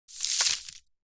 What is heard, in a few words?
Drop a handful of sand